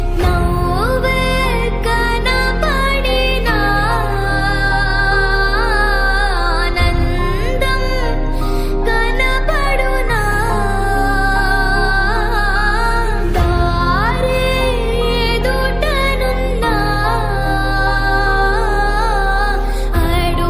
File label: South Song Ringtone